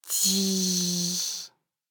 combo break

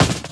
fillin

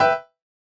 buildup G6 E6 B5

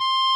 solo

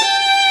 violin; osu

